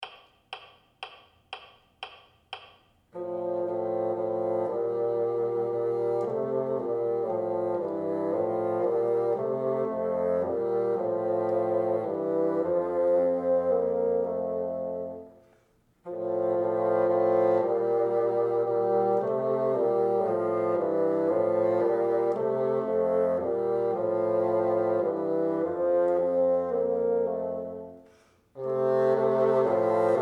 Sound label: together, bassoon